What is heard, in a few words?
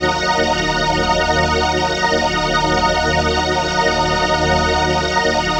PHASE PAD, ATMO PADS; Best Service; XX Large